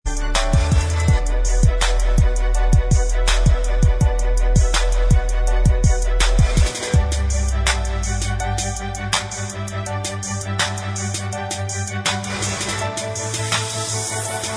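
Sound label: instrumental, track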